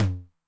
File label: Noise Med, 909 LOW TOM